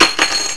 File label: chink; Gravity Balls